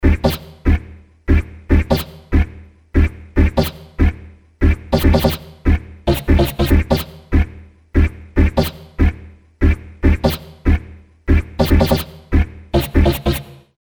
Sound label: bass, industrial